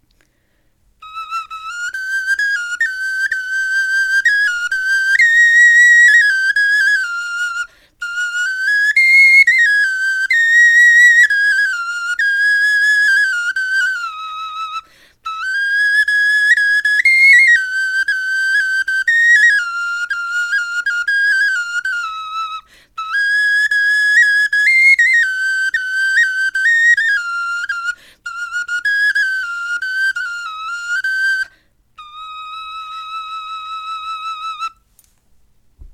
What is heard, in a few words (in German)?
melodie